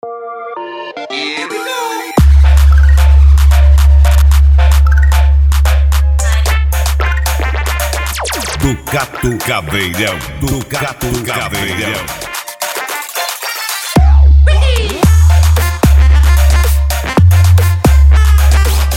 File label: funk mega bass